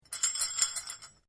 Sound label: Bell Handbell Priest Japanese Ornamental Rings Rattling; The ornamental rings on a Japanese priests bell; Bells